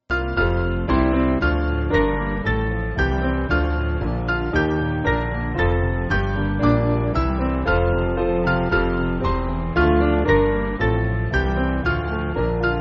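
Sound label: Piano, Music Backing; Dear Christians, one and all rejoice; Nun Freut Euch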